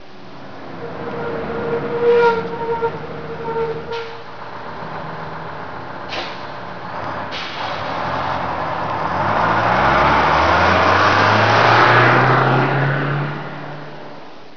vehicle; GRSITES Graphics

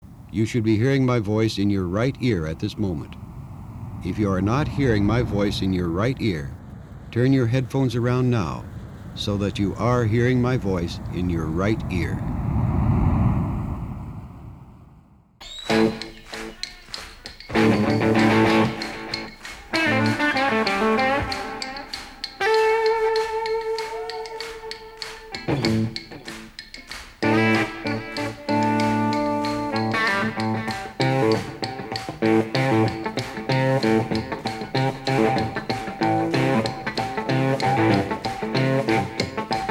Speaker Check, binaural; MEDITATION

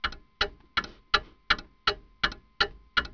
household; GRSITES Graphics

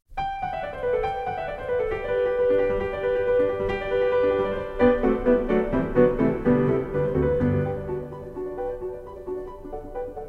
Toccata In G Major, PIANO; Masters of the Roll, Vol. 12

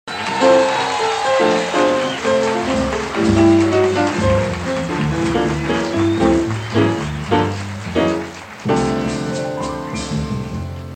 Fanfare

